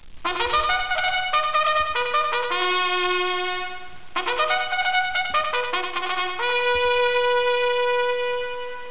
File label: bugle